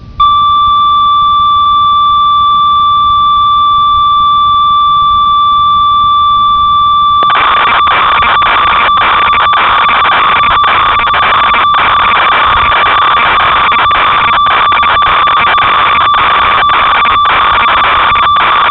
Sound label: ob6, synth